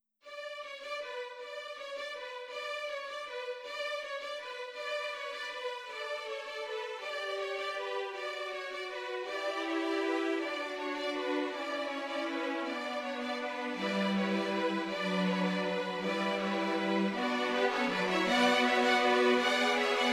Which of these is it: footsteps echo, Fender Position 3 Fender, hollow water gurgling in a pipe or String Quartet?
String Quartet